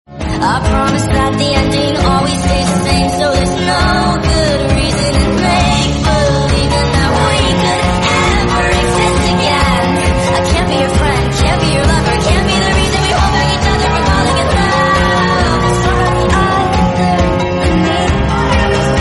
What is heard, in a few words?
remix english song